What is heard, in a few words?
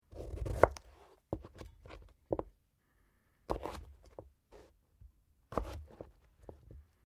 Carrot cut; Food Prep; Restaurants